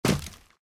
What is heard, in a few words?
taunt flip land; video games; source engine; taunts